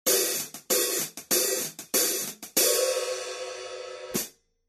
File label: 15in New Beat HiHats, cymbal sound